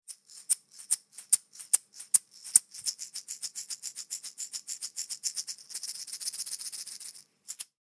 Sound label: shaker rytmegris sonor; rytmegris trae